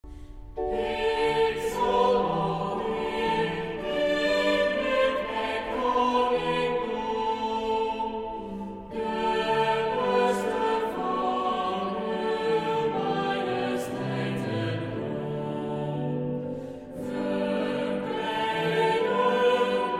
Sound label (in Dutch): Psalm vocaal